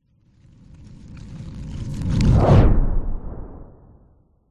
sound design whoosh burning lead into strong powerful whoosh; zapsplat